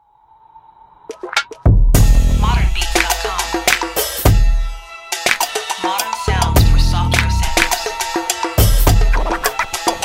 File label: Ethnic Loopz, ethnic percussion loops